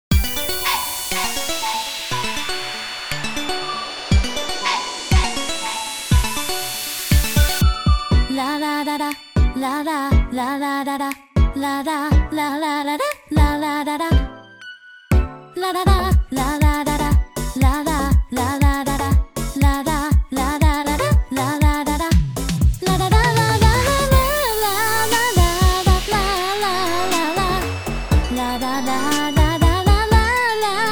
KJ-pop ワンコーラス

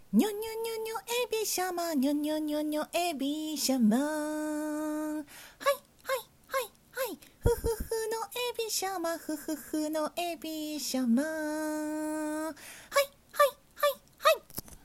ソング

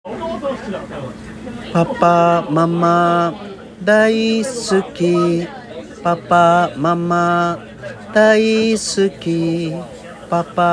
仮歌

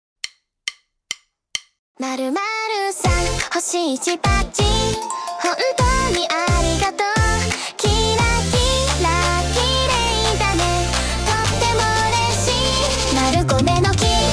カウント付き

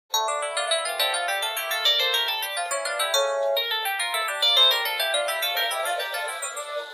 着信音

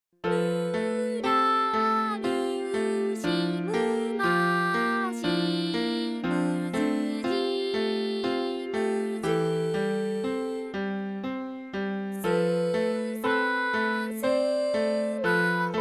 未然形 ピアノ

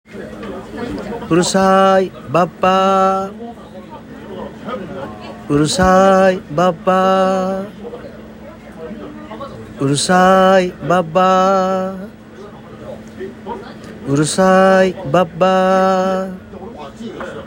仮歌

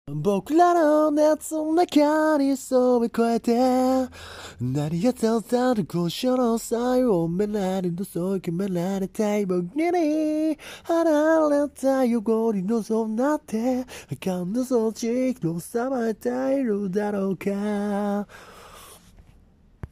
仮ボーカル

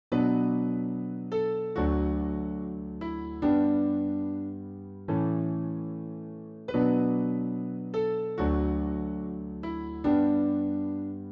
編曲依頼 ワンコーラス

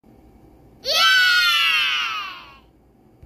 こどものイエーイ

